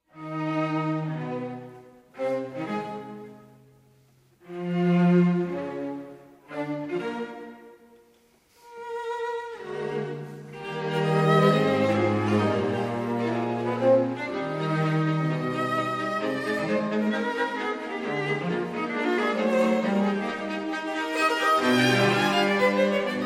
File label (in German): logomusik